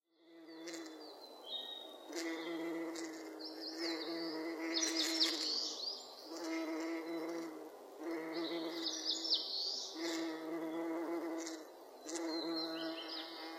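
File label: komaruhanabati, bumblebee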